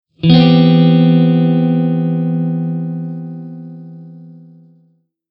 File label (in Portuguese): Campo Maior Acordes Emb6